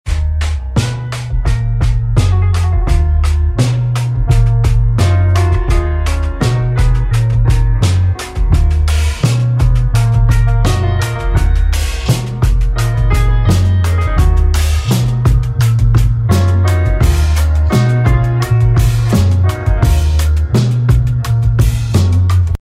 start round, music

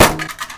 plastic box impact bullet; Half Life 2

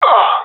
death; Counter Strike; CS:GO; professional epic

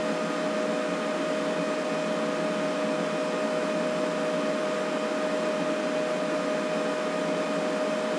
tech room, office

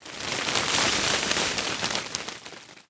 foliage react explosion

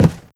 step run, footsteps cardboard

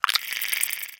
curious, clams; infestation

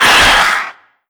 pain, antlion; creatures; vox

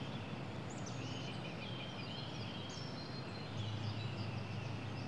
birds, ambient animal

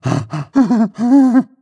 breath long, zombie

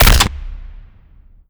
bolt huge blast

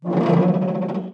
metal stress; ambient; materials

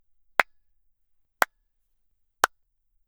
handclap anechoic; Half Life Alyx

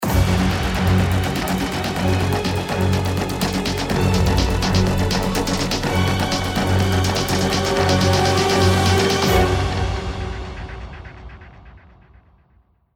round ten sec count, music

